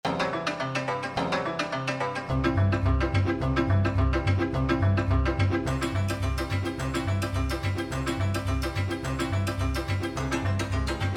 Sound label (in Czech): podzim slovenska groteska a potom valcikogroteska